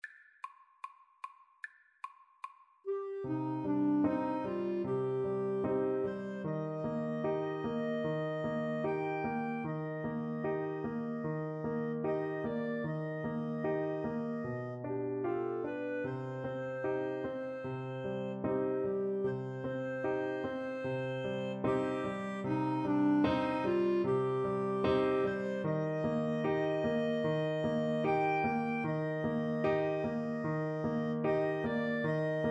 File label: Flute Clarinet Duet